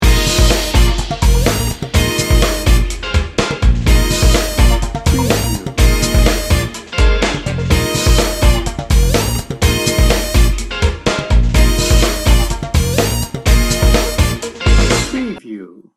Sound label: feel good loop